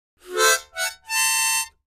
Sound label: Mouth-organ, Short Final Chord, Type 5 -Ascending, 7th Chord; woodwinds harmonica